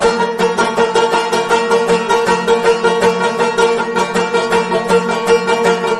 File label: banjo; music